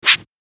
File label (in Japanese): 投げる